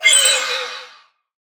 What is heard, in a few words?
allay item taken; mob; minecraft